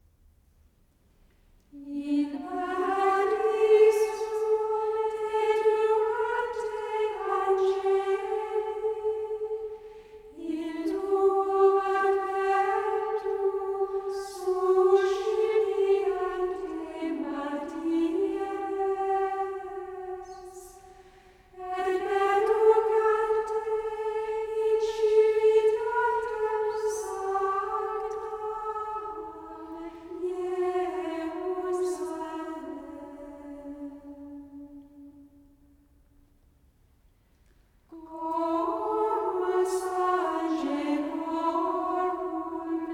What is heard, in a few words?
in paradisum chorus, gregorian chant hymns